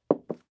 Glass Down On wood; Fairlight Sound Lib

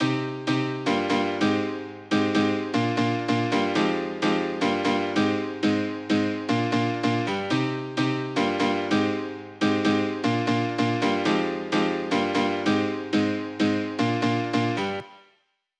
128 BPM C Min Chords; House; Drop Loops; Cymatics; Halcyon

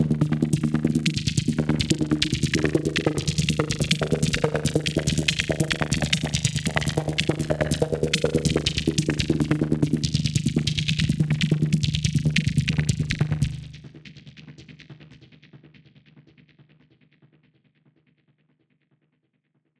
Lead Line D# 142 BPM, psytrance; Lead Lines; Beans

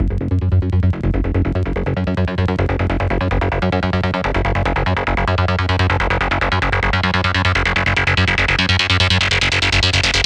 Mad Waves Lead Line F# 145 BPM, Psytrance; Lead Lines

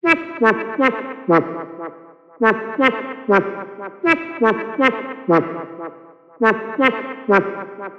Loop Synth 120 E; Deep House; Ester; Ghosthack; Advent Calendar 2019

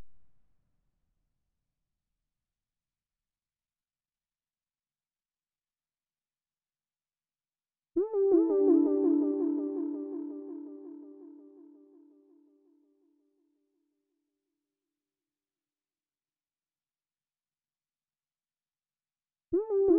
166 BPM A Min Synth Accent, RnB; Melody Loops; Gave It All; Cymatics; ANALOG EVOLUTION Beta; RnB Collection

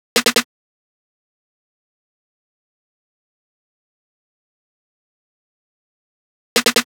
Drum Loop - 150 BPM Fill Snare; Drum Loops; Loop Stems; Cymatics; Mystery Pack Vol 4; Konopio Drum Loop

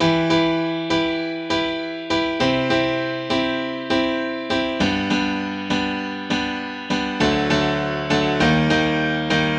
Grand Piano 100 BPM (E Minor); Fly Away; Ghosthack; Free Guitar And Piano Samples

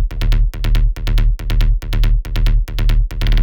Bassline D# 140 BPM; Bufo

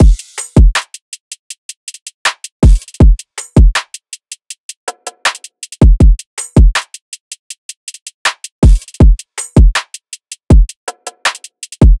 Full 160, Trap Drums; Drum Kits; Ghosthack; Drum Mega Bundle; Achieve Drum Kit

